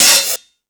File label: Dance, Drum Machines